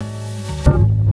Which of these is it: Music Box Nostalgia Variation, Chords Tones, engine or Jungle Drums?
Chords Tones